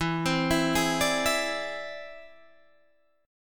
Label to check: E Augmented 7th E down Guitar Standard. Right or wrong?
Right